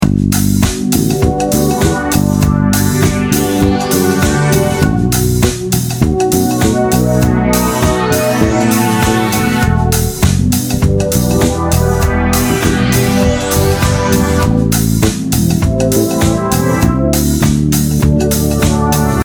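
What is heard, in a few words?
13625 G major, G–B7– Emi–Ami–D7; the same progression with the B7 substitution; bass; chord substitutions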